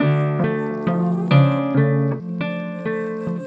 Dusty Melody, Cinematic Funk; Sonic Foundry (Sony Creative Software); Cinematic Funk Urban Tone Poems

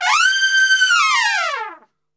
G 4, Brass; Best Service; Brass Super Section; TRP LNGFLL

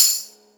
shaker w residu; Sonic Foundry (Sony Creative Software); Ugly Remnants VOL-2; Beatstuff

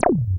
wasp sting; Masterbits; Vintage Voltage; SEQ-FX

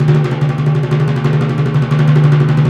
PRC TIMP, TIMPANI; Roland - LA Composer vol. 1

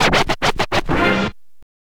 scratch short, Vinyl scratches

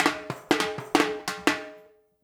FILL, Percussion

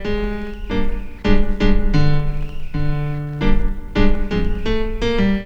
Piano; Sonic Foundry; Stylus Pressure Urban Grooves on Digital Wax; Back Forth

